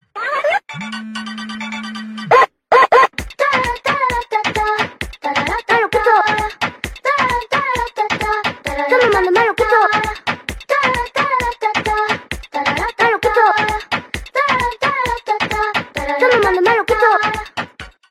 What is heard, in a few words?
Phone Toy Version